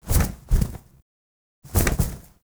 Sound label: Owl Finisher Stop Fail; Warframe; Duviri; Animals